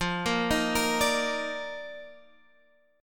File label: D sharp Major 7th Suspended 2nd